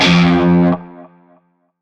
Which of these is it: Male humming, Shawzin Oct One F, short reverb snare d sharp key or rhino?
Shawzin Oct One F